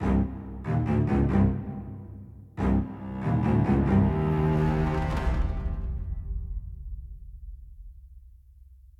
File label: Action 140bpm Intro; project zomboid